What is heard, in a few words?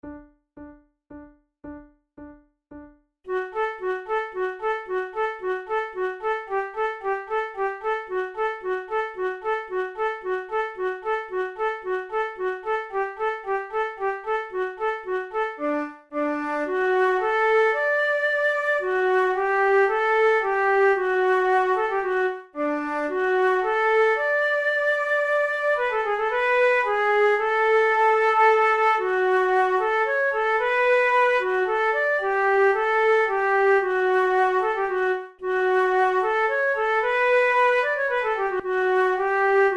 duett, flute